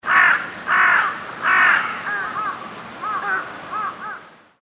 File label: Crow Caw; 環境音